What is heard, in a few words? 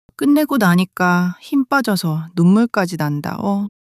crying solo crying